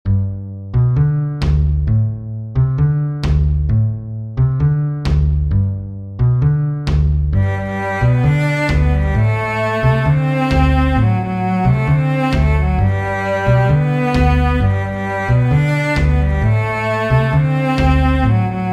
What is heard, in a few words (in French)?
Samba en Sol Majeur aigu